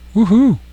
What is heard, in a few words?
woo Hoo